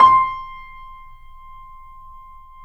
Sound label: C, Piano; InVision Interactive - The Piano